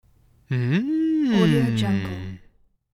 male mmm

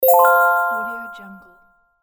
correct

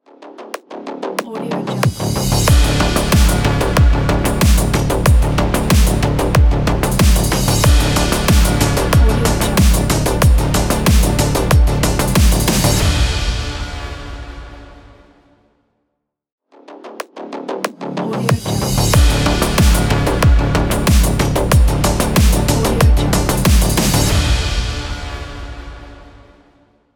cyberpunk logo

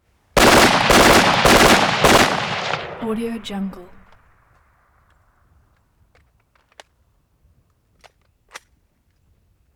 assault rifle; prosoundfx

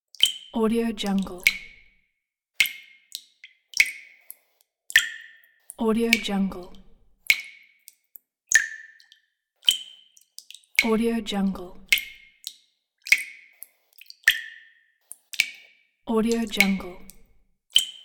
water drips

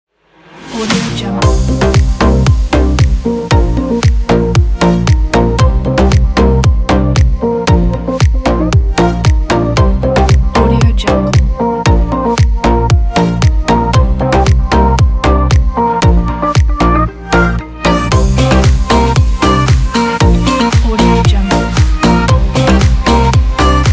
dance upbeat pop

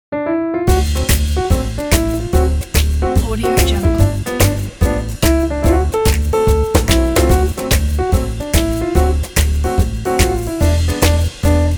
vintage swing jazz trio

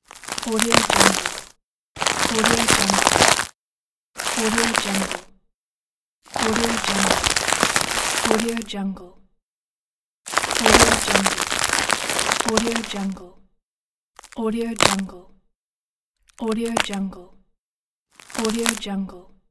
breaking a tree; kaelv

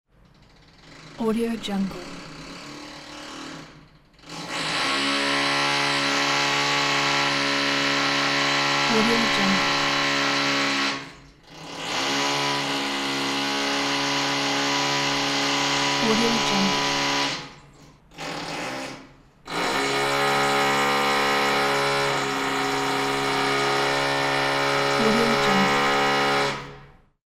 neighbor drilling

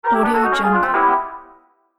cartoon failure

